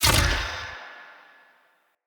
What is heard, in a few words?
Bow Shot; Weapons; Player; Fey Thorn Bow